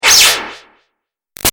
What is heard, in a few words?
Laser High Echo Out